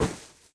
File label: Gift Poof; Game rip; MiSide